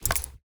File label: ui submenu open